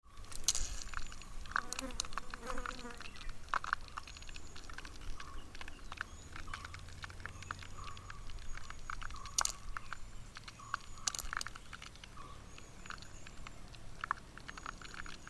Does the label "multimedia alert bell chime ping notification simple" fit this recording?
No